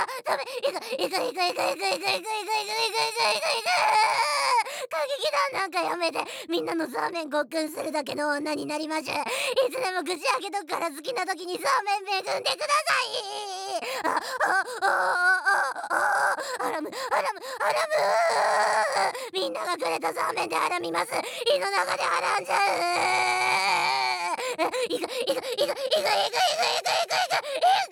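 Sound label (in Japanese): ザーメン ゲップ（2連発！）; ザーメンゲップ集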